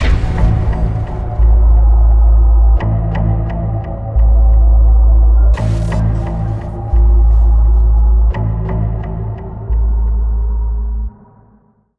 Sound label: sfx ui start menu; Hellpoint